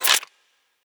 Weapon Foley; Robobeat

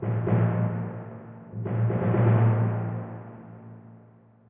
Tymp

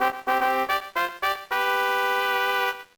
Unknown Jingle; mario tennis power tour

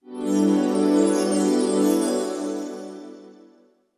Fanfare Item; the prophecy lies

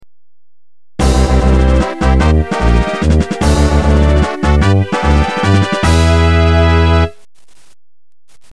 Unused Fanfare; pokemon ranger unused themes

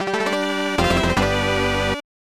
Lose; combatribes snes

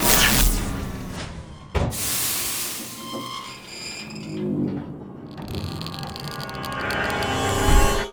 crane break jam

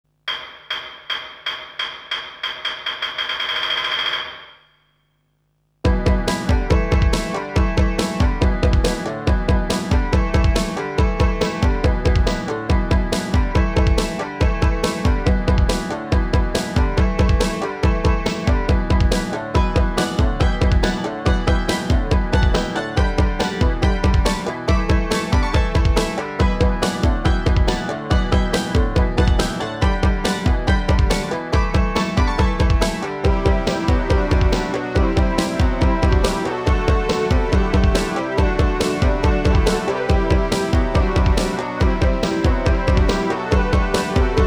Synthesizer Version; Super Famicom Magazine Vol.10; The Great Muta

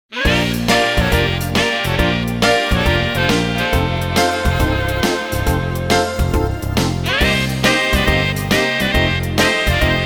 prologue - instrumental; City Hunter Special '99 original soundtrack

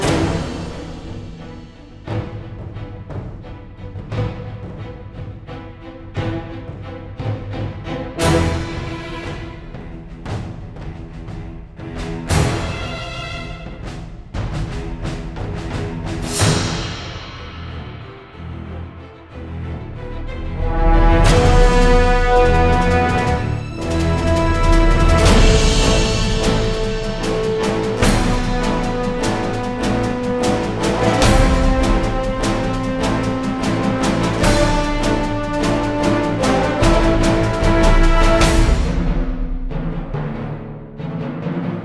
Battle Loop; Eschalon Book I

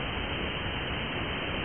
Falls; Buggy; PS1

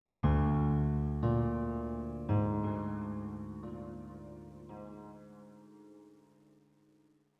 One shot Piano

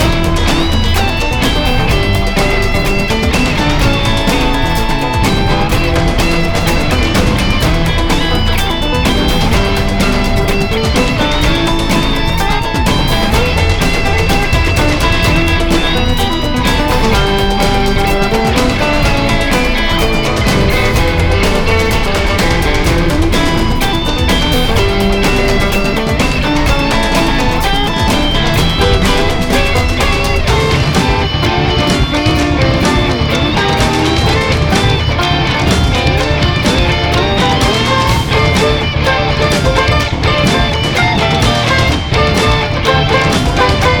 Evil Forest Menu Loop; Super Meat Boy; PS Vita

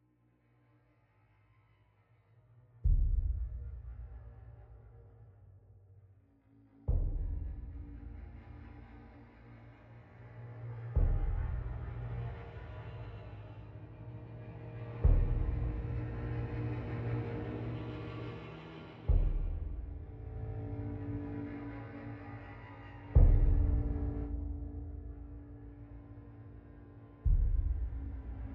Tension Pulse Mood

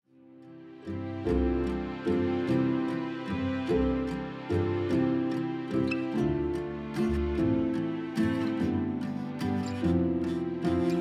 Music START; barbie dreamhouse adventures; BBRoom

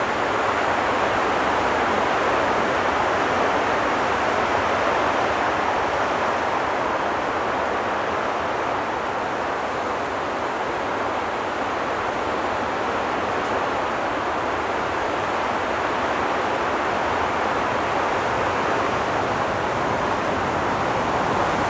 River ambience

